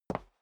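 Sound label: step dress boots concrete single right, footsteps; schritte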